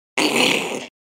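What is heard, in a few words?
werewolf cub growl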